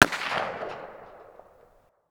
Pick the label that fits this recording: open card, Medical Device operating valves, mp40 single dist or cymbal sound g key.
mp40 single dist